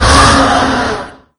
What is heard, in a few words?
attack, lostsoul; doom3